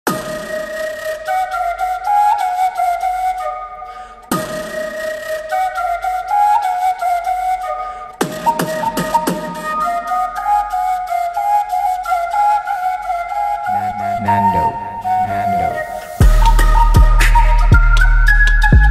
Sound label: Flute trap remix